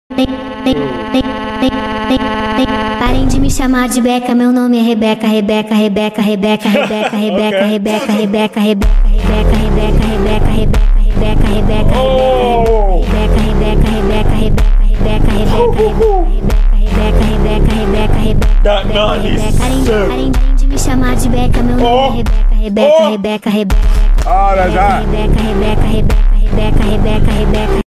phonk added; audio meme

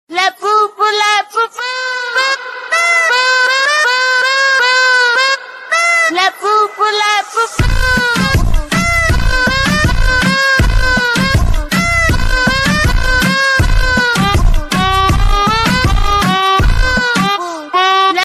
reggaeton super slowed; labubu; audio meme

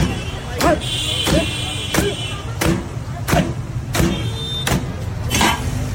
indian street food; audio meme

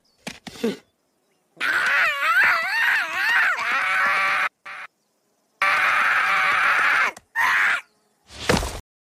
screaming; groot; audio meme

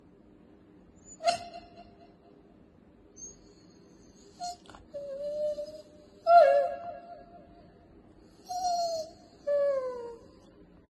dog echo sounds

